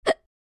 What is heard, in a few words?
Young female teenager